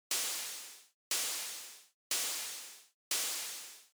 ultrabeat tom noise

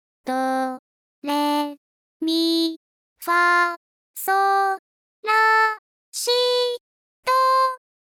do re mi

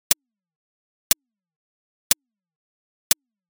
ultrabeat tom attack

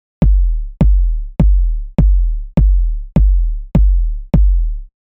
kick sub bass

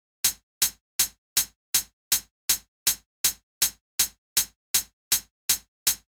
hihat 8 beat

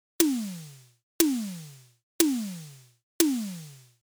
ultrabeat tom mid

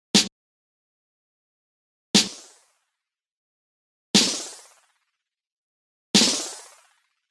tape delay snare

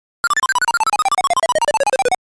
8bit miss; donki

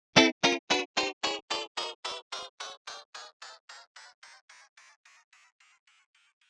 tape delay

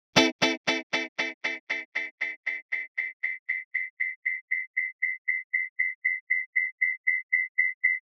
tape delay

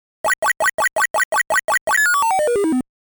8bit; donki jr 2men

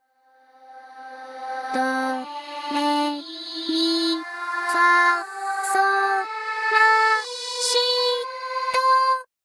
rev rev echo mix

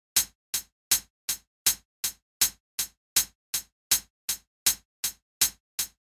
hihat 8beat vel

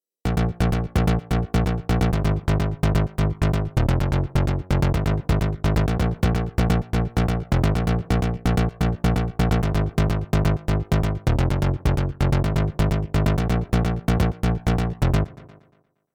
synthbass

